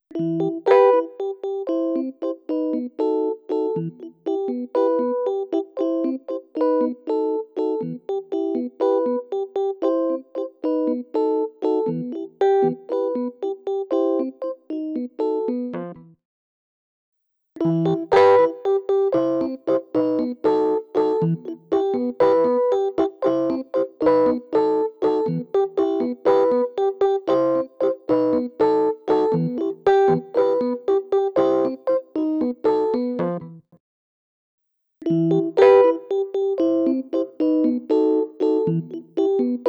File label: piano